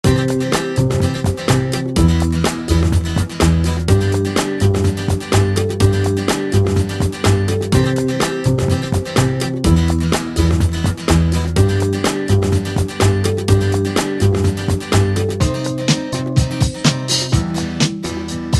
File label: Happy Loop; The Fancy Pants Adventures World 1 online gamerip 2006